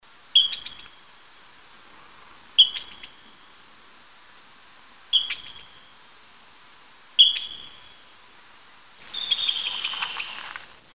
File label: flying squirrel